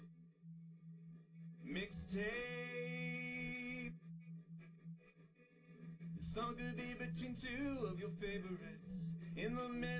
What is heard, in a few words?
drums, live rec